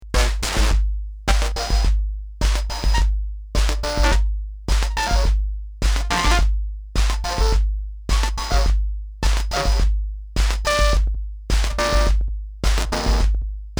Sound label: snare fm; radioace; By Mike Walters - Chapel Hill, North Carolina